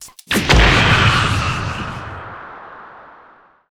plasma blade; weapons; ufoai

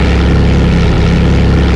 wasp jr; dhc2; flightgear

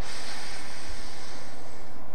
hiss, aliens; ufoai

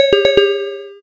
dee doo dee doo; linux-virtual-jay-peak; soulride

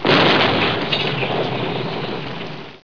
miss; netpanzer

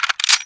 pistol reload; blobAndConquer; combat

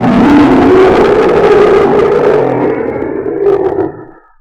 huge angry, aliens; ufoai

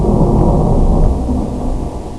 thunder; gl-117-1.3.2

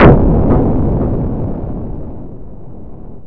match end; AssaultCube

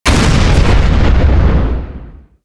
explode; gamediameter

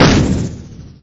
attach big gun

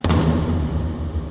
Beat; eboard

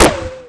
attach gun

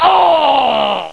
ugh; bugsquish

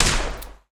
micro shotgun; weapons; ufoai

